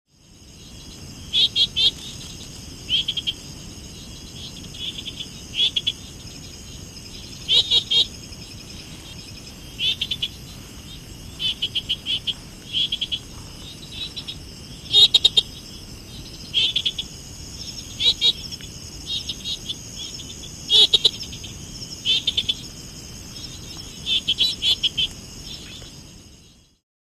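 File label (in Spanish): Ejemplo de sonido, THE CALLS OF THE FROGS OF MADAGASCAR; Fonoteca Zoológica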